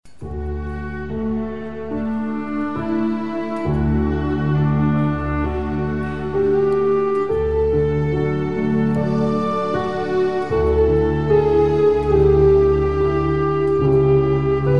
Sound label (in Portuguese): Piano e Cordas